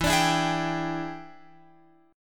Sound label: E 7th Sharp 9th Guitar Open F, chord; E7#9; strummed; x,11,14,11,11,14